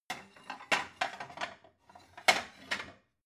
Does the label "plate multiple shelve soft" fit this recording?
Yes